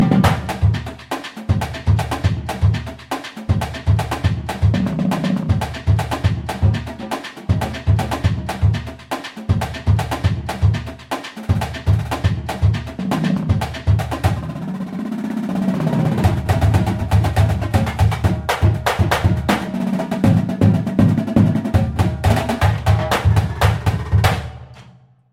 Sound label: latin drumline ringtone